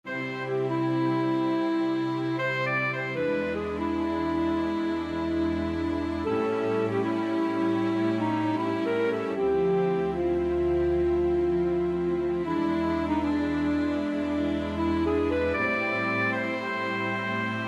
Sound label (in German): statisch Klavier Liegeton